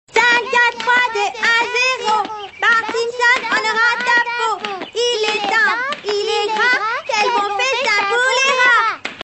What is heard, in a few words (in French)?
saut corde